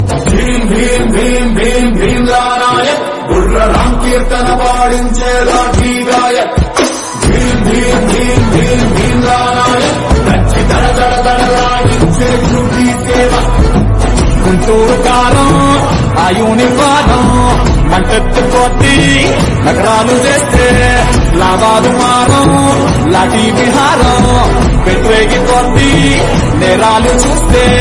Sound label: Telugu Song Ringtone